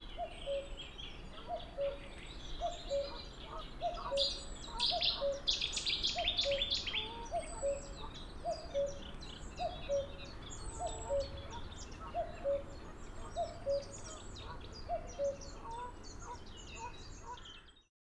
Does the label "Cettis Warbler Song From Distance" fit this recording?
Yes